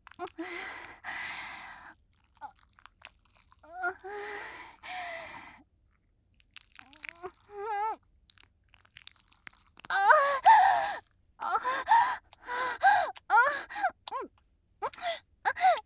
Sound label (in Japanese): 挿入 喘ぎ; SEあり